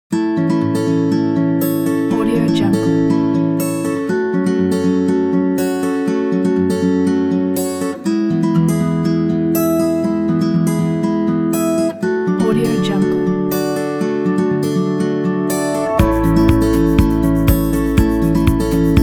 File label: motivational guitar